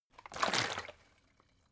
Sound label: bottle water big upend; berufe